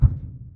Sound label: footstep jog; Titanfall 2